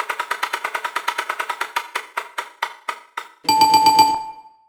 wheel

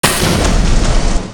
falling crates

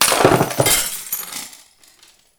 glass shatter

